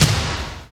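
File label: SNARE, left; East West - Phat & Phunky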